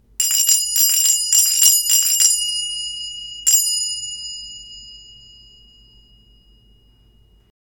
German Silver Afghani Zils

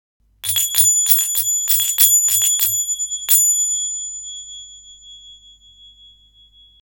ghawazee brass zils

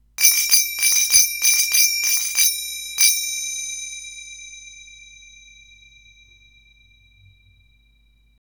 afghani brass zils

